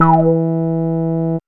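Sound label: synth tone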